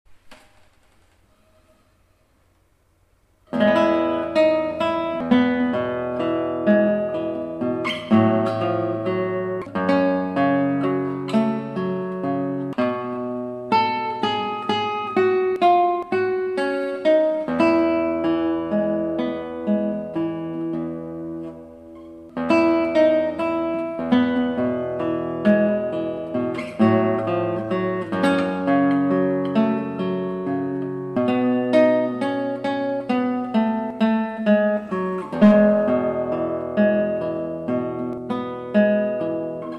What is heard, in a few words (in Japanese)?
夢見る人, ギター; 夢見る人、マリンで